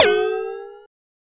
Bend Up; FREE SFX PACK VOL 3

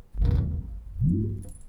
Woom, chairmat; Micro Pack

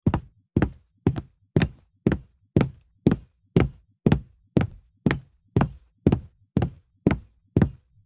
Wind Footsteps; horror; horror sfx vol 1

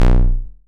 Retro Event Wrong Simple

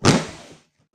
weird, sfx

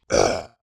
grunt, creature

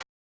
Attack; Attacks

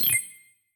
confirm, tones; inteface sfx pack 1

